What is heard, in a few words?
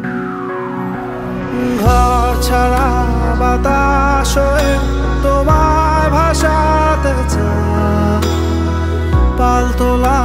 Bengali ringtone